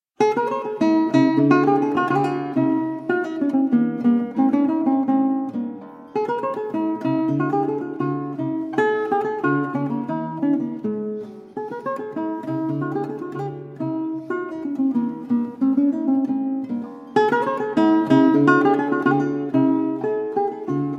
Guitar Sonata in A Major, Allegretto, Classical Guitar